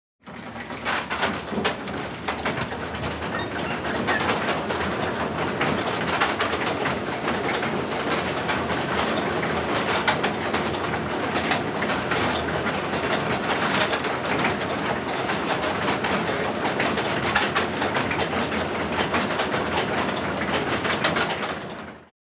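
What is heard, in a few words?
water wheel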